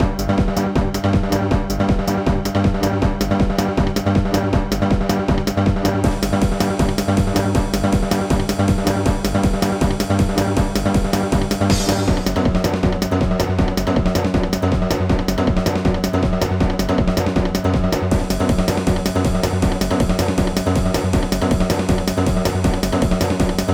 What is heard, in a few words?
night impulse fast